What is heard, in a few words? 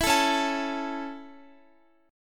Db Minor Sharp 5th Guitar; strummed